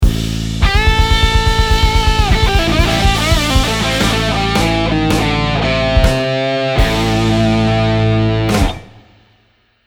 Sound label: ending phrase, guitar